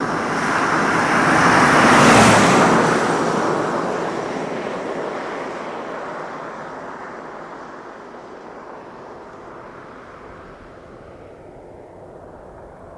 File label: Car Pass By Fast